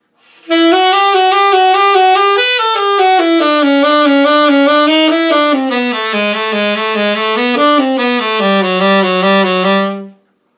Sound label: saxophone